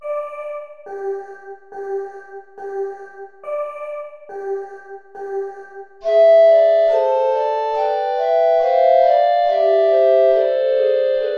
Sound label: play along without bass recorder; bach johann sebastian; wie soll ich dich empfangen